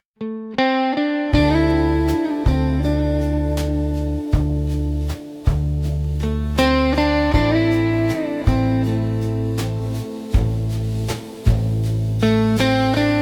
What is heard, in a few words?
Ukrainian blues